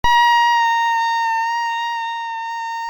moustique

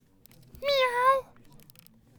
miaouuuuuuuuu

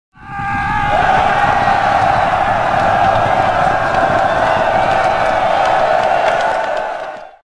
charge armee

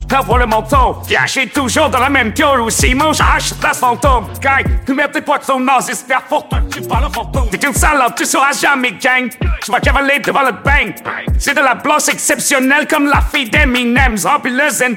musique

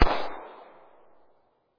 son de tiro

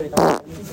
prout

